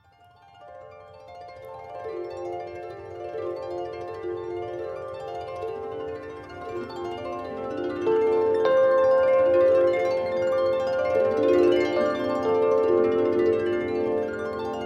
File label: Duet